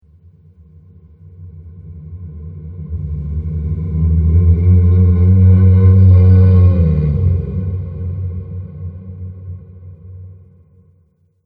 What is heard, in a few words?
Ghost Of The Horror; Ghost Sound Effects